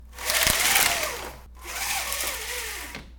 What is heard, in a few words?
Blinds Up Down